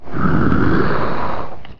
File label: Whoosh